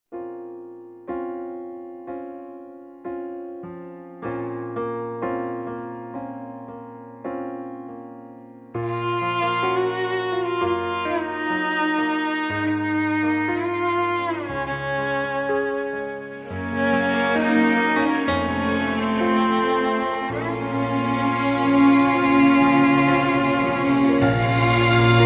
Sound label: instrumental; persian